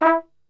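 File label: E 2, flugelhorn; Best Service; Brass Super Section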